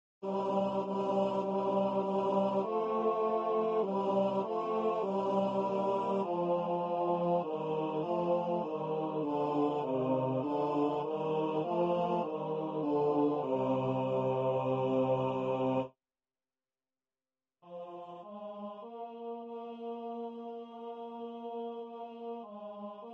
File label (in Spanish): tracto barit